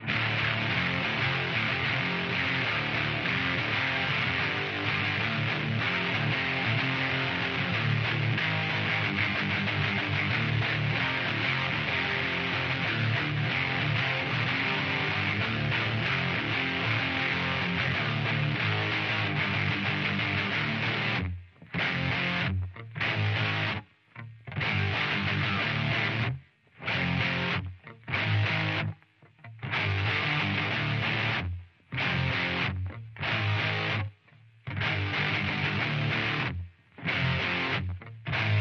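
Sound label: guitar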